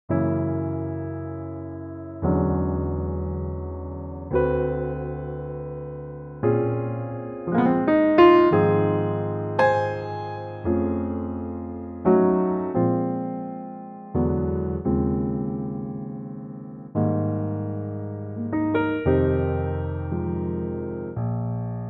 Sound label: Ab piano; key - Ab - vocal range - Eb to Gb (optional Ab); Wonderful blues drenched standard in a very lovely piano only arrangement.